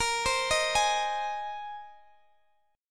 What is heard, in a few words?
A sharp 7th Suspended 2nd Suspended 4th, Guitar DADGAD chord; x,x,20,17,18,18; down